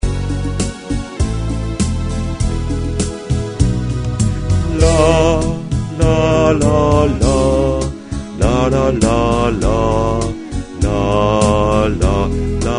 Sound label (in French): le sud intermede basse